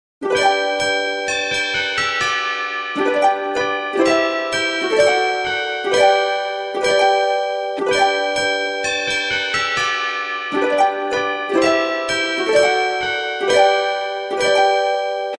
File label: Ding dong merrily on high ringtone